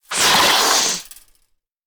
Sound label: Queen Hit Critical